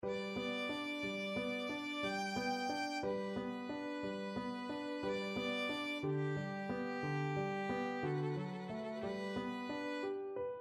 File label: sonatina violin